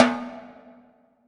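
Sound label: TIMBALE, drum machine; Drum Mashines; EdgeSounds; JD DRUMS KIT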